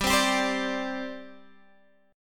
G Suspended 4th; Gsus4 strummed; x,10,10,12,13,10

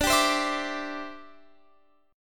D 7th Suspended 2nd Suspended 4th, Guitar Open F; D7sus2sus4; strummed; x,21,19,19,19,21

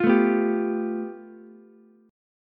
A 7th Suspended 2nd Sharp 5th, Ukulele; A7sus2#5 strummed; JGuitar

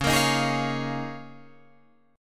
Db 6th Add 9th Db, down, Guitar Drop D

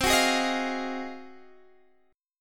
G sharp 13th C

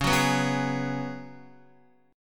C sharp 7th Suspended 4th C sharp, Guitar; strummed

